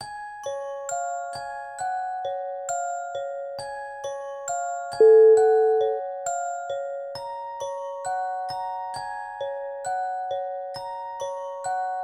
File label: good night lullaby